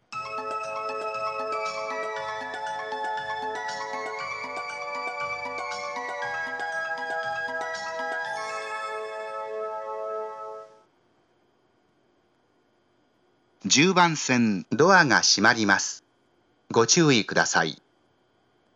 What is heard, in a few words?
utsunomiya melody